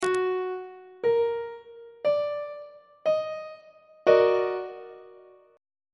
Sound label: e flat minor major 7th 1st inversion; on treble clef